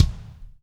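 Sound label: floor tom, right; Spectrasonics; Burning Grooves CD2; HIT ME KIT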